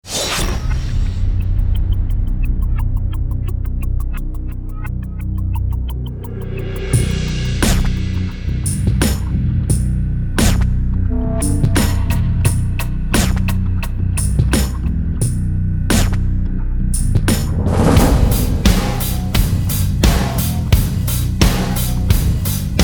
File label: drums